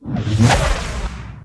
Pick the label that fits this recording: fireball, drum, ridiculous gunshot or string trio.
fireball